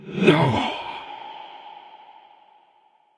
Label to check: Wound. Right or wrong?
Right